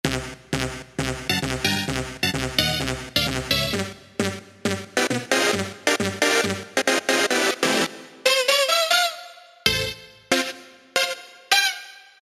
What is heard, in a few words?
Techno Hit; Roland SC-880; Factory Patches